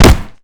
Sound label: long kick; Call of Duty Modern Warfare